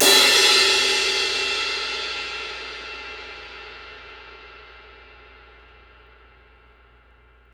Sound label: Cymbal Hit, Impact Drum Hits